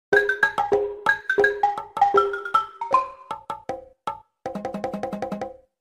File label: game over percussion only; super mario 64